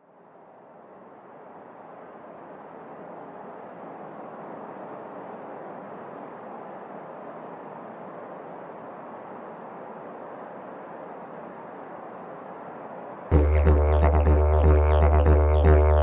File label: Didgeridoo player; australie; The most beautiful Didgeridoo player in the world; a la decouverte de l'australie